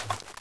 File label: rpg movement only